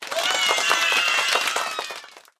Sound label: bubble cheering; game rip; line bubble